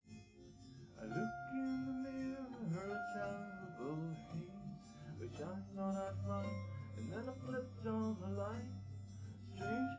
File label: nodrums liverec 250ms 5cm sim